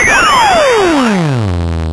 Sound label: Arcade Riffs